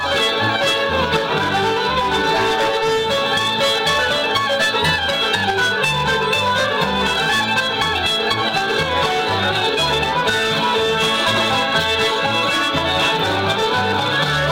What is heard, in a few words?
bluegrass band; oakland june